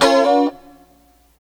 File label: C, FUNK CHORDS; Guitars